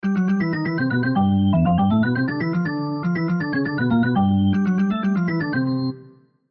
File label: Music Organ Mexican, Music Organ Mexican Hat Dance Melody; Keyboards; Musical; Keyboards (Sound Effects:Musical); Blastwave